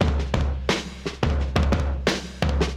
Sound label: 87 bpm drum groove c sharp key, kick tuned to the C# note